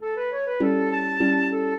minuet, flute harp; Mozart

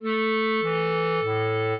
clarinet; Mozart

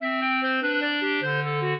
trio, clarinet; Mozart

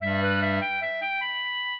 clarinet; Mozart

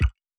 click

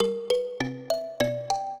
trio, mbira; Mozart

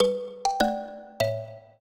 trio, mbira; Mozart

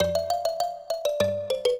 trio, mbira; Mozart

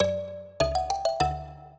minuet, mbira; Mozart

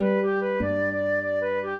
trio, flute harp; Mozart

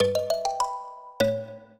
minuet, mbira; Mozart

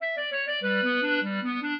minuet, clarinet; Mozart

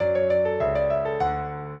trio, piano; Mozart

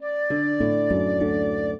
minuet, flute harp; Mozart

